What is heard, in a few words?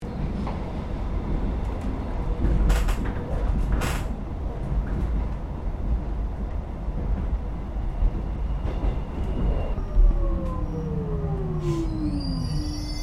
Train Melbourne Passenger Xtrapolis 100 Interior 2 Stops, Melbourne train interior; Trains